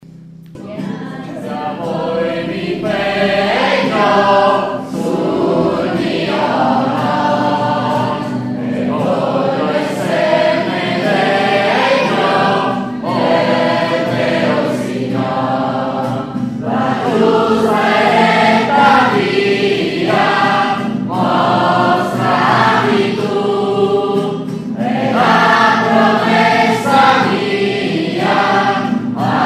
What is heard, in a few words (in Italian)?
canto alla promessa